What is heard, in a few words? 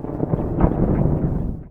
THUNDER; Best Service - XXL Production Effects